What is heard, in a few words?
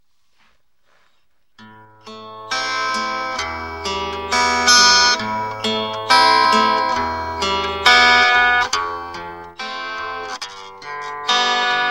NO BUFF AMP